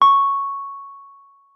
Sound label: WURLITZER, C#6; Xsample VOL-1; 90 s Sample CDs; WURLITZER RS